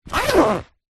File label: foley luggage case small childs zipper